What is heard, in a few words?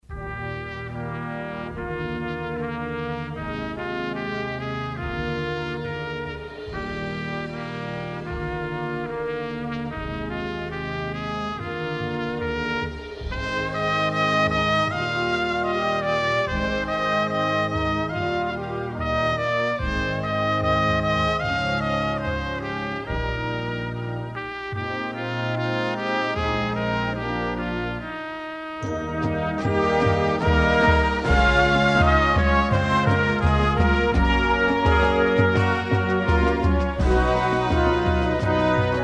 drumline